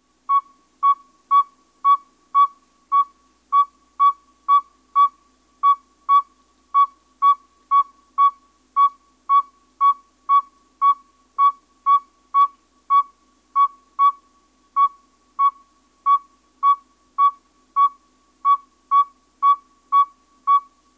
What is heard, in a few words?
saw whet owl; owls